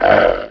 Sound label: wolf preattack; the monster plane v2; kolmods